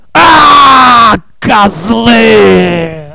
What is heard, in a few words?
pain; DUKE RUS